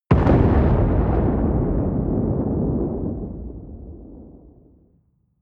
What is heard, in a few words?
missile large explode distant